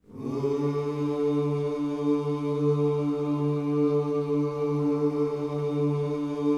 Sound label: WHOO D 3, Vocal Gospel; Spectrasonics; Vocal Planet Vol.1 Gospel; akai